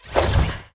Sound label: shadow dance cast; hero; mirage